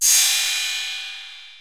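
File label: 808 cymbal; Wizoo; Platinum 24 Electronic; 480L GA 808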